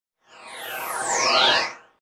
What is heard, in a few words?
Synth Spaceship Approach In & By; Electronic Sci-fi